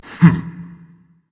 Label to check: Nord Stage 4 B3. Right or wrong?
Wrong